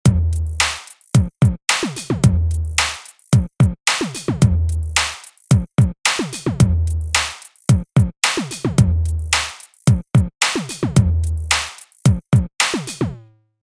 Waverunner Terminal D

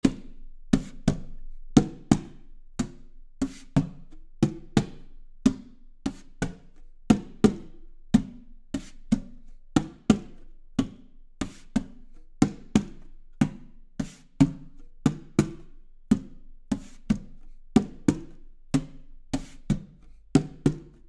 Roland Dimension PERC Plugin

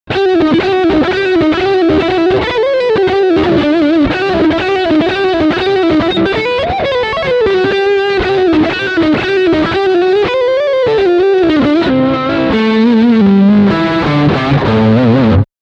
Lick Front Humbucker

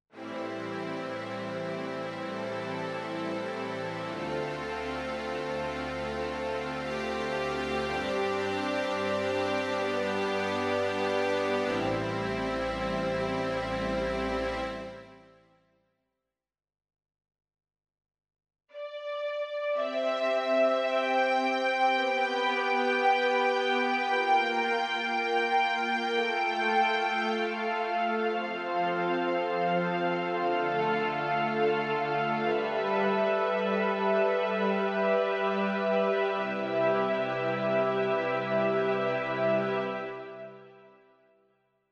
PX5S Strings

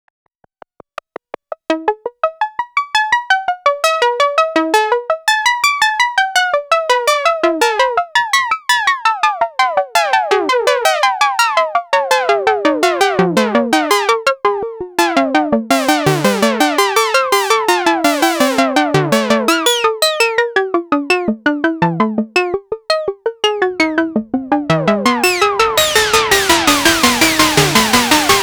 Little Sequence Noise

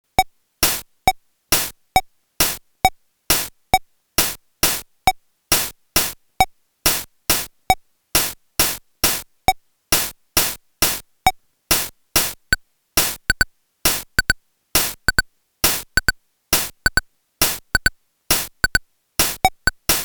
Casio VL1 Rhythm

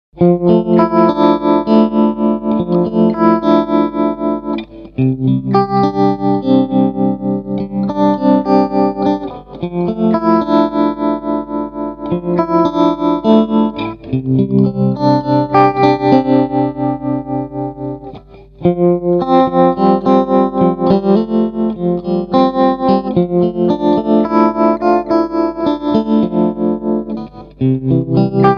Tone King Sky King clean channel tremolo

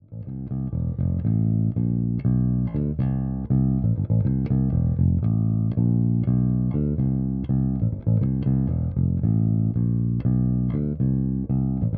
Zoom B2 Four; TE 400 SMX

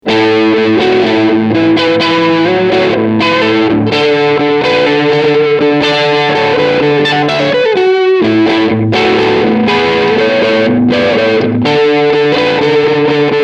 AC30 Emulation

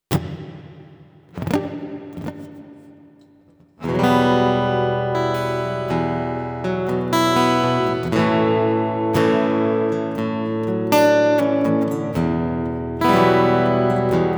Mackie ProFX6v3 Plate

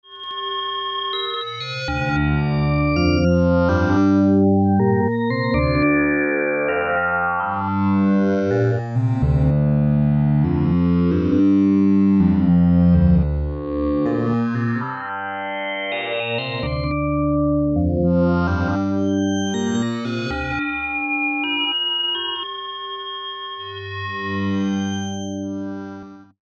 Wavetable Crossing

